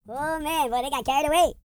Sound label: E TALK, Vocal Planet R&B Dance; Spectrasonics